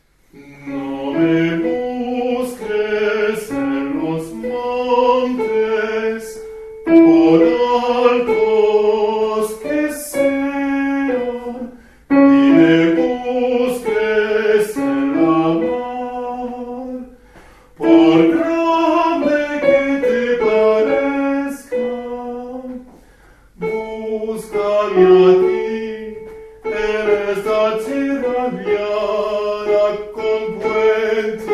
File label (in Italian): Soprani; ESTA TIERRA